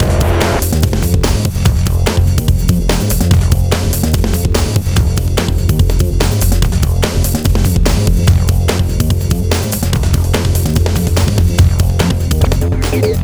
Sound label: Hardcore Breakbeat, 145 Fmin; Big Fish Audio - Hardcore Breakbeat Action; Hardcore Breakbeat Action CD1; 05 Engine